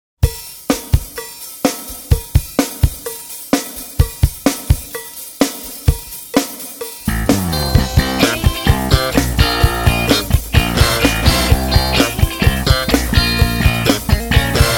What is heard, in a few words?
Brass Rock; Soundzabound Volume 4 Disc 1